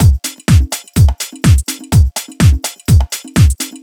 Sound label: Drum Loops, 125 BPM, Underground UK House & Electro; Sonic Foundry (Sony Creative Software)